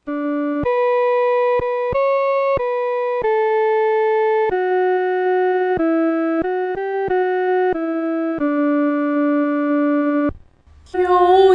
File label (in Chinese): 独唱 第一声; 靠近神的怀中